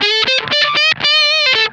BIG LICK, Funk Guitar; Zero G - Funk Guitar

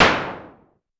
Noise Hit Lo, Clavia DDrum-AT, Pitched Down

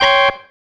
Stab, Organ, One Shots

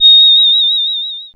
Synclav FM, WIND, A#; Big Fish Audio

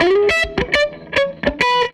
SG Guitar Loop

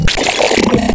Glitch

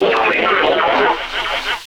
SFX, 180 BPM, Drum & Bass

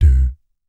DOOT C2, Jazz; ILIO; Vocal Planet; Jazz & FX; JAZZCOMBO

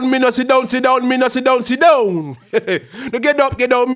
HAL RAGGAM, RAGGA-MUFF A maj

